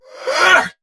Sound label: Attack Effort